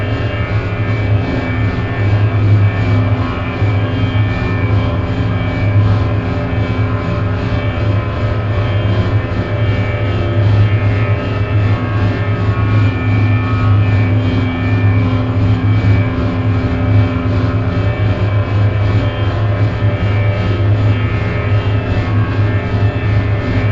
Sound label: Robot Arm Track Slow Close; Boomtown; Titanfall 2